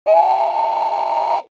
悲鳴

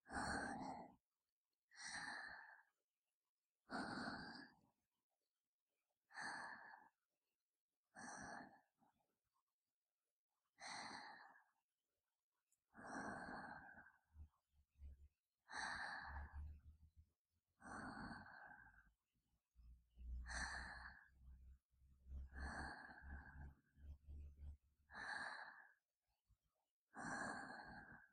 寝息パート, ASMR; ルナ

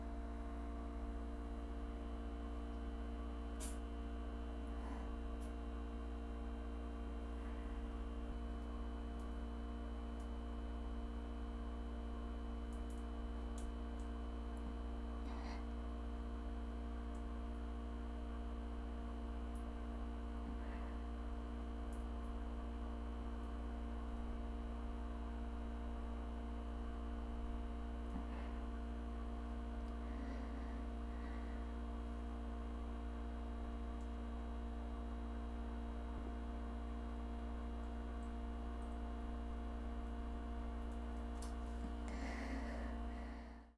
大、小さめガス音ミチ音ポチャ音吐息

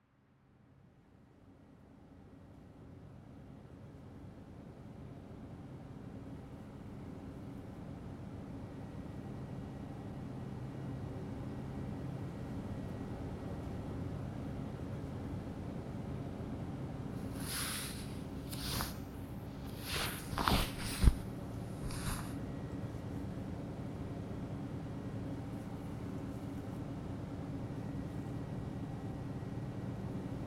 耳かき左, ASMR

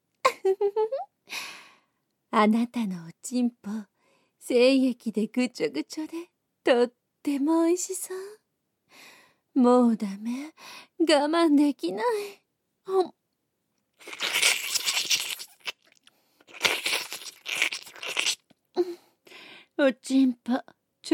フェラ連続抜き＆脳内語りかけカウントダウン; 10回出すまで眠れません 魔女の連続搾精地獄編; 効果音あり

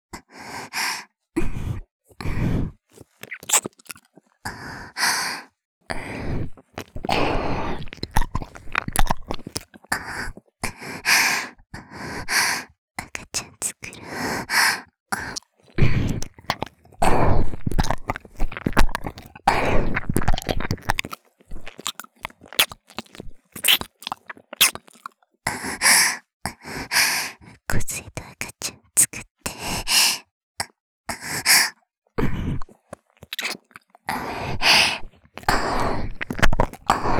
耳舐め喘ぎ; 赤ちゃん作ろ♪

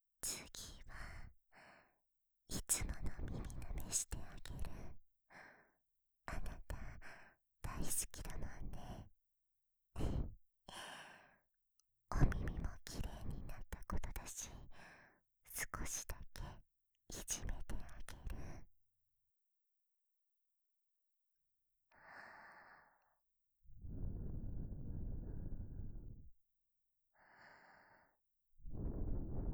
ラブラブ耳舐め

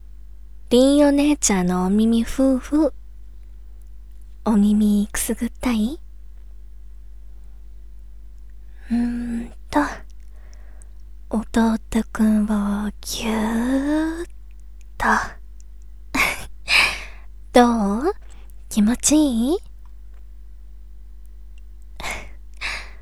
BGM無し効果音入り お姉ちゃんのお耳ふーふー。お耳、くすぐったい?; 凛お姉ちゃん

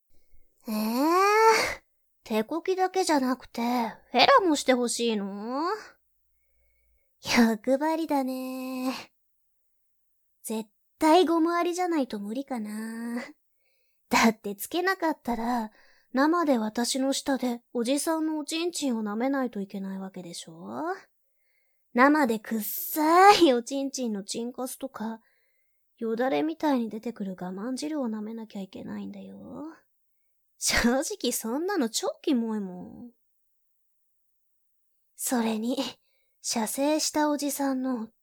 手コキ勝負編

